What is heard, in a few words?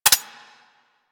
Uzi Mech; Warframe; Tenno